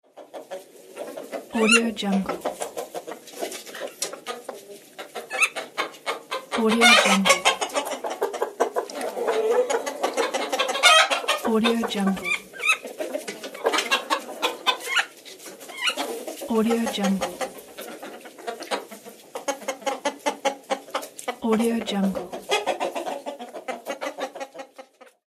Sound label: chicken house